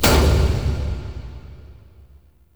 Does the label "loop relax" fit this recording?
No